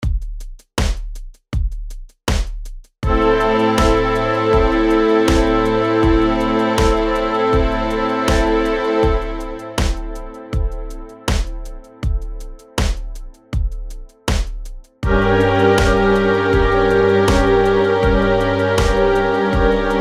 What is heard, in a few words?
chord quality id